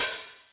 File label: OHH 909, Drums; MicroDexed